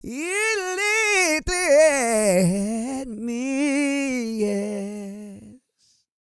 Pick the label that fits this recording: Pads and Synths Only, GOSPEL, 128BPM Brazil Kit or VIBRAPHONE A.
GOSPEL